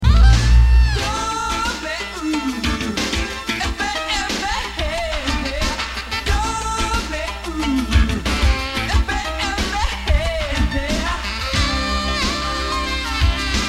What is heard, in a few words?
Jingle, radio